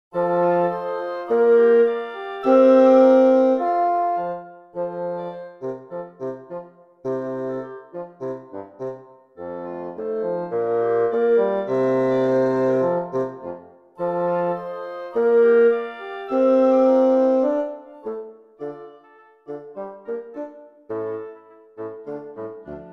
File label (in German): Fagott